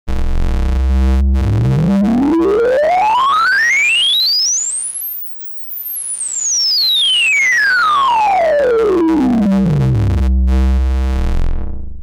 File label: LC MS Reso HPF